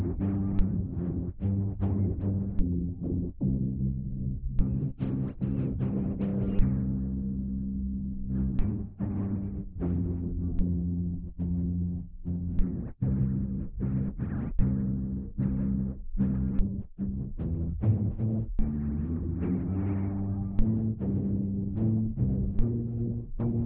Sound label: gen bass